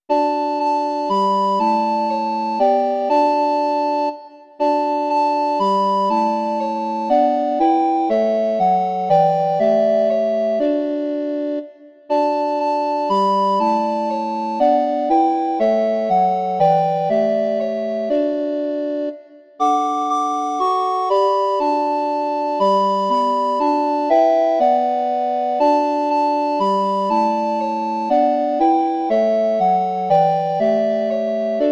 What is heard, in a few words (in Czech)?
kvarteto zobcových fléten; Aby nás Pán Bůh miloval; Imaginativní verze